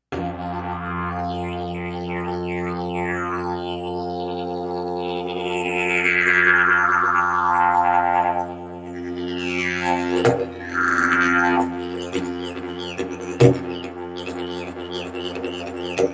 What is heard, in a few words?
didge